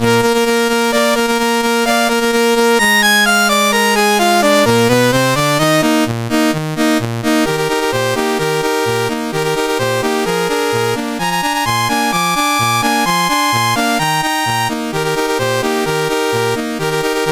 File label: -, CSG8580R5